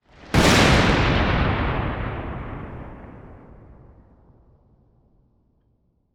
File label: Weather Storm Lightning Bolt Crash Crack; AdobeCC